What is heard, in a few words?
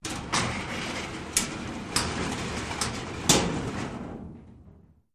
Elevator Gates Doors